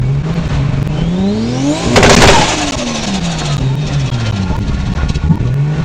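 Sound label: Guitar Bang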